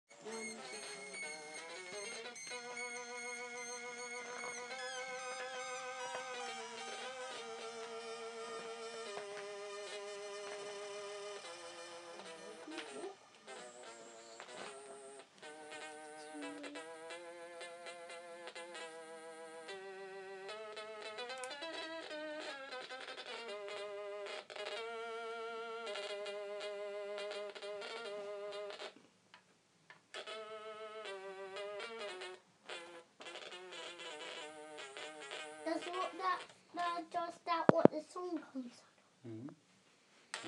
trying out the stylophone with the monotron delay

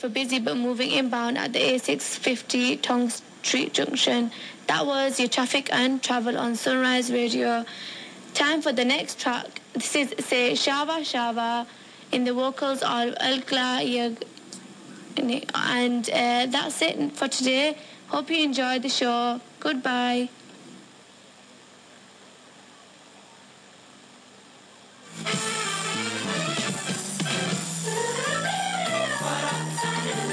bad traffic news jingle; sunshine radio fm

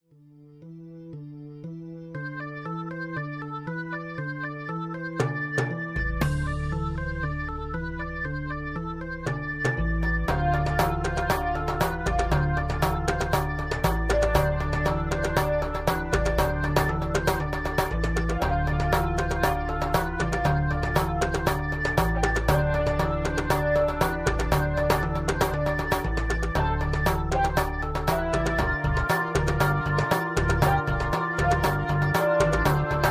latin percussion bongo conga timbale; african kit

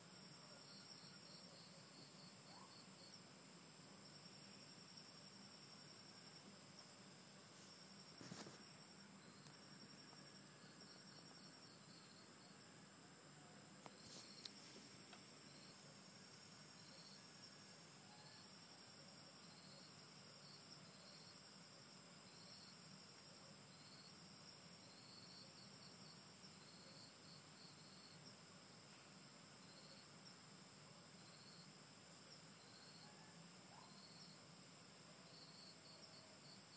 rainforest sounds; maya hotel ubud bali

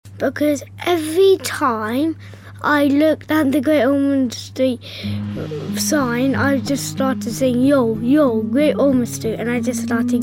charity rap single

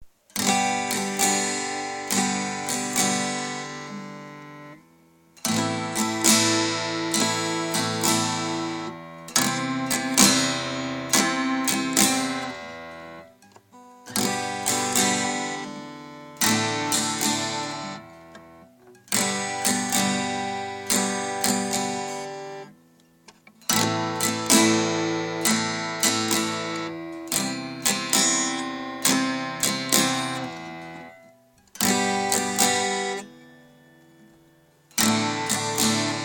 killing a guitar softly

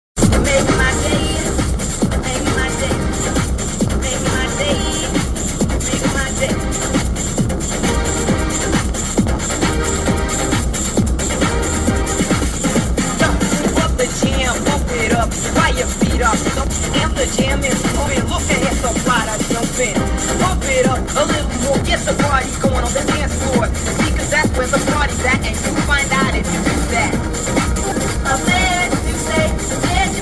techno retro

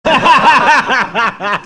laugh

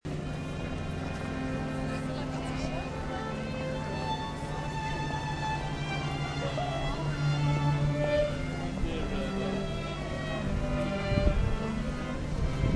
string quartet, spring market; manchester

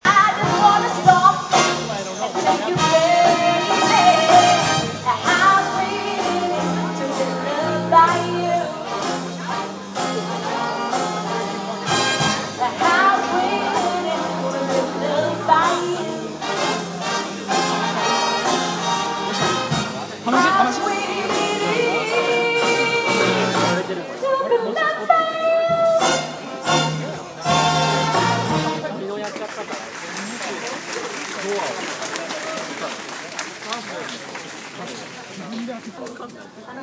jazz festival

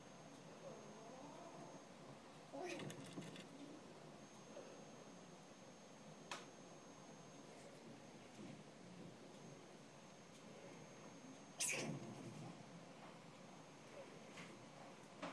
squirrels

